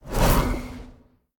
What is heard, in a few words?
whoosh; Smite; Tiamat